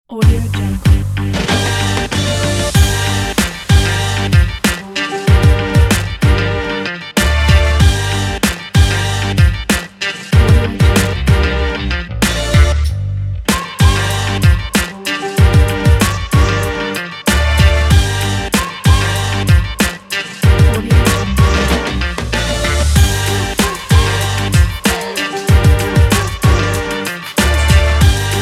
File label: hiphop